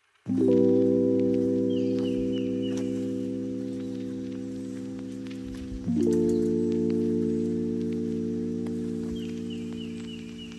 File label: rhodes and birds ringtone